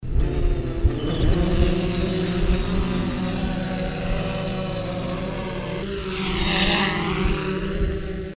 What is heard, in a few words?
drone